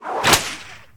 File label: Attack underlay